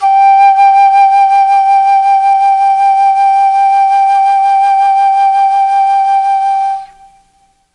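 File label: G3 chamberlin flute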